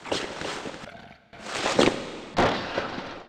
Die

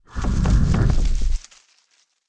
Elven Tree Idle

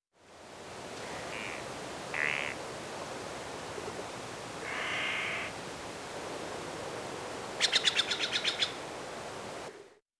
Frog

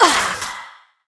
Dmg; Seer; GamigoNA

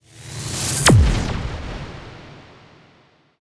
Light Explosion; Seer; GamigoNA

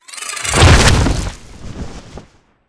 Spade Trumpy Die

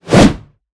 Attack

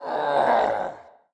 die

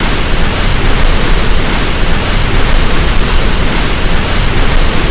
Waterfall

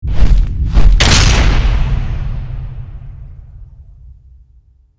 Chimera Skill

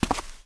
Knight Walk; Seer; GamigoNA

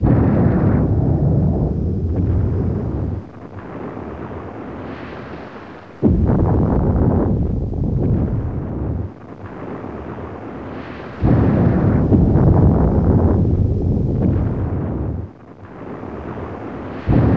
volcano; Seer; GamigoNA

